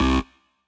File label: bass